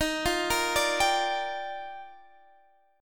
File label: G Minor 7th, Eb; Gm7/Eb; arpeggiated